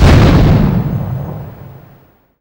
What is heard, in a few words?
Explosion, cartoon Foley Fx; musicradar; cartoon caper